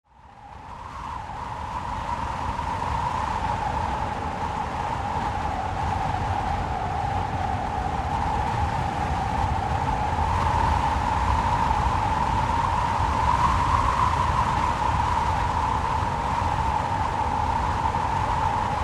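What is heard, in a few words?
sky sounds; zvuki neba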